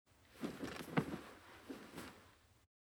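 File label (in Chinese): 温柔的吻和舔耳（左耳）, ASMR; 艾莉温柔的吻和夏洛特的舔耳（左耳）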